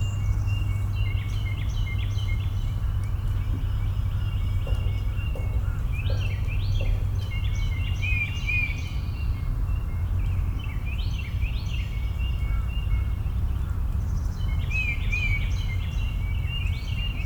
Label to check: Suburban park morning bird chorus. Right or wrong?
Right